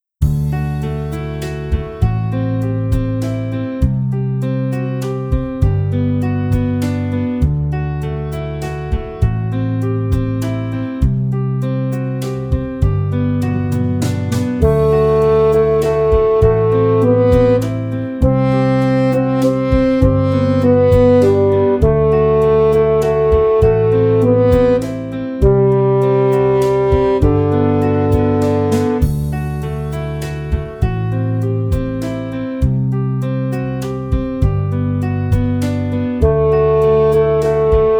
Horn hoch; easy beat vol 1 horn new

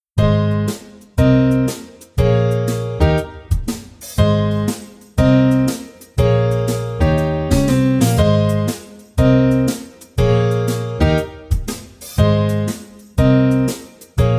Playalong SWING hoch; Horn; easy beat vol 1 horn new

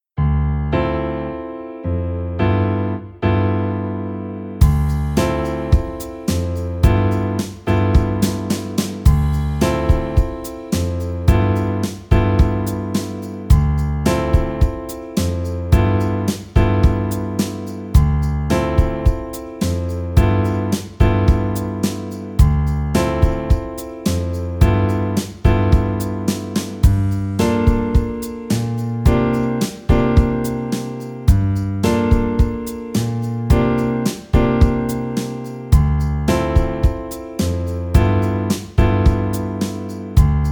Play-Along, trompete; Playalong-straight; easy beat vol 1 trompete

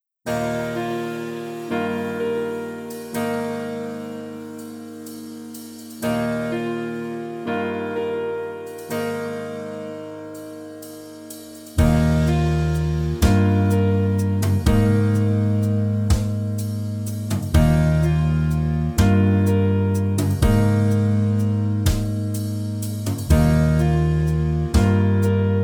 Playalong hoch, horn; easy beat vol 1 horn new

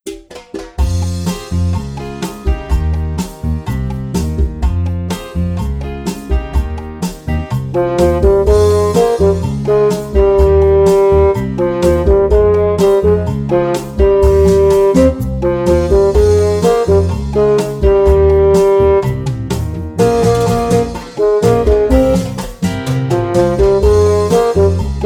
Horn hoch; easy beat vol 1 horn new

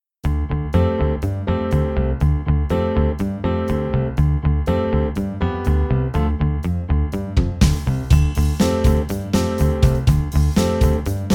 Play-Along, trompete; easy beat vol 1 trompete

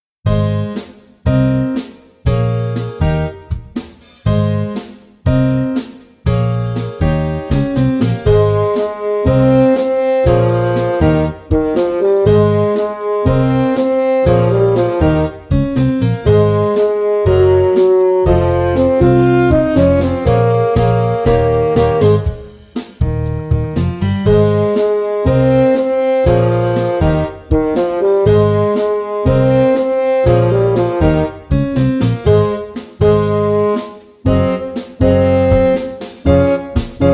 Horn hoch; Melodie-straight; easy beat vol 1 horn new

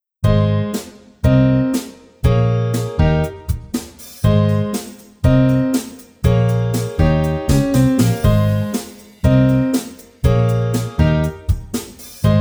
Playalong hoch, Horn; Playalong-straight; easy beat vol 1 horn new

